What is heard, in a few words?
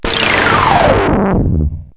ball